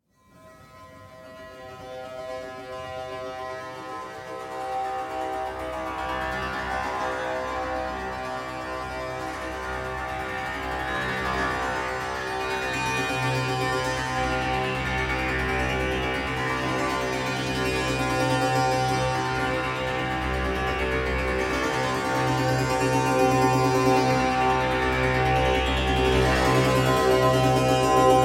monochord therapy sequence octave c cm 107; instruments; loops

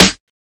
2000s bright acoustic snare sample g key

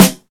short steel snare drum f sharp key

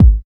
short kick drum sound g sharp key; Duration 0:01; Mono; kick drum samples

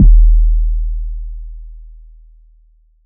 puffy kick drum sound d sharp key; kick drum samples

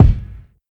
tight lows kick drum single shot e key

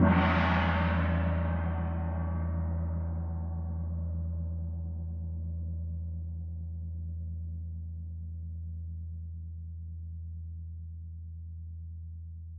gong hit medium - Em; cymbal drum samples

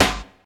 airy snare drum sound g key

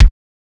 smooth kick drum g key; modern kick drum

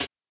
good hihat sound B key; hat samples

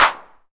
clap sound clip e key; percussion; single hits one shots; Bitdepth 24

